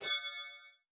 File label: item medal pickup